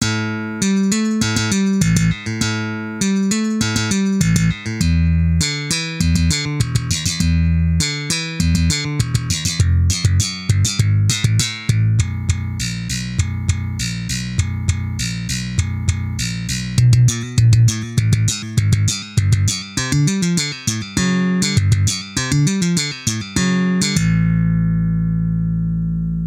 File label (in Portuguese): slap e pop 6 cordas, contrabaixo eletrico